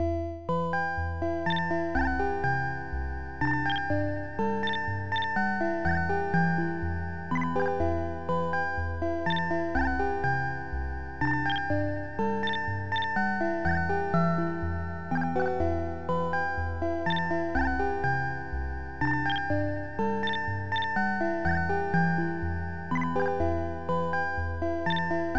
keygen song

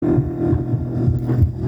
Alien amb

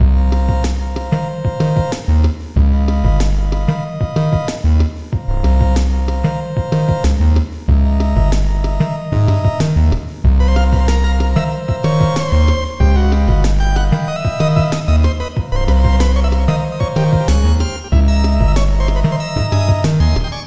keygen song

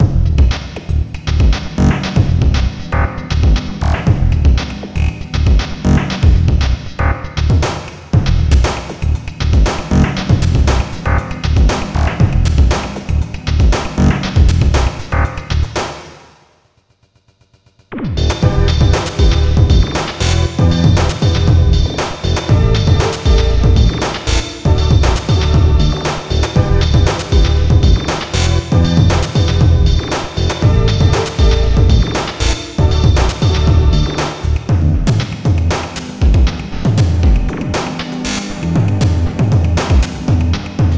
keygen song

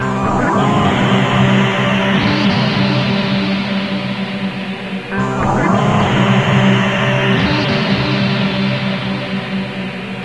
amb

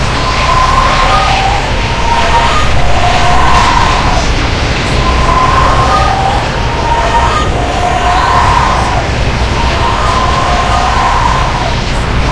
teleporter pillar ambience

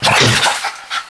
jump; ghouls forest

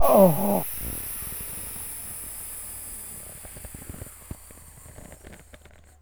death, cleansuit player

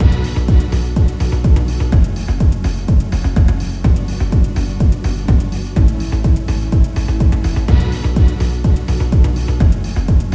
keygen song

